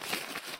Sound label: Homescreen Uninstall, LG phonetones ui; LG X5 2018